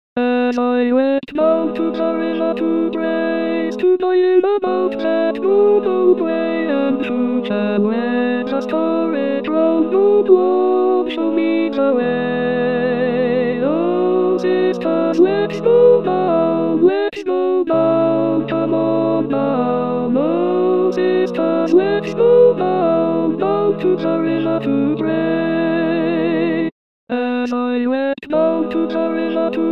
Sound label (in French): Sopranes Down to the River to Pray